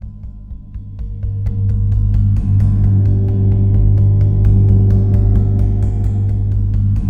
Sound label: TAM T; Xsample VOL-9